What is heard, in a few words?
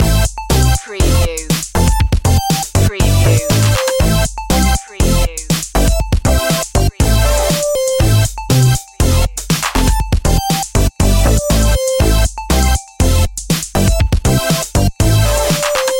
video game fun loop